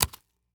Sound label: Pistol Fall On Dirt, weapons; AdobeCC